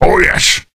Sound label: map Search Decide; rage of the seas